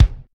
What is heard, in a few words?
smooth steel kick drum sample a sharp key